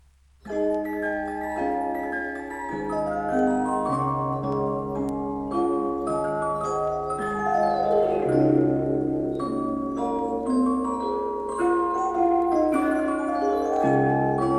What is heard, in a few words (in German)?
Musikdose